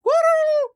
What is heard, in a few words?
teleport; Gorb; Hollow Knight